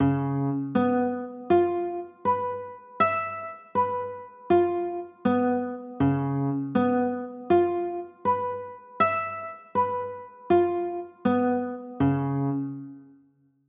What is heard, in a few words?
d flat 7 sharp 5, chords; d flat 7 sharp 9